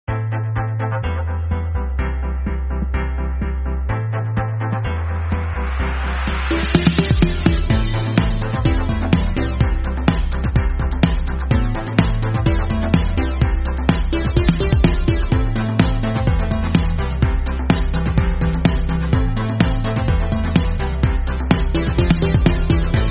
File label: Pop